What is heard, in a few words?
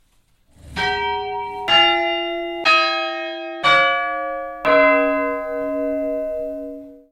spliced recording of the five old bells